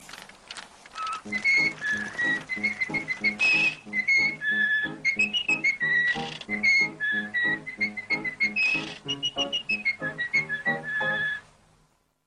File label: whistling ringtone; mickey mouse